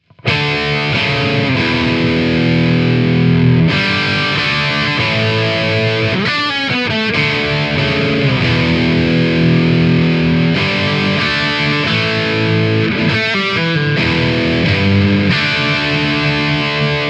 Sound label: X-DRIVE ORANGE; xgear